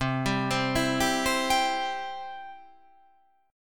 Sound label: C Major, C, down, chord